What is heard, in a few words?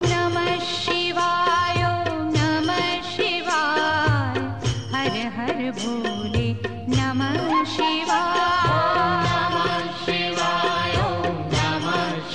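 Om Namah Shivay vocal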